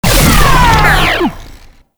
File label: Mutalist Big Jump Scream; Warframe; Proto Infested; Abilities